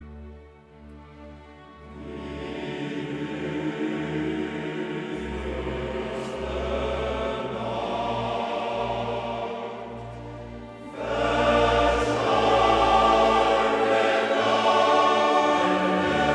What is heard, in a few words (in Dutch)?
Koor mannen; Mastreechter Staar